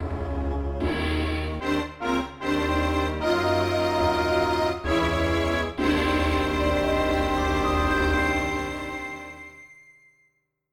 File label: boss clear; oot